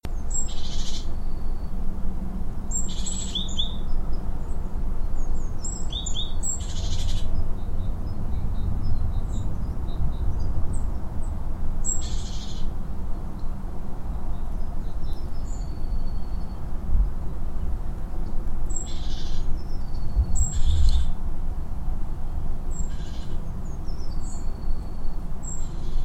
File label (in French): Mesange charbonniere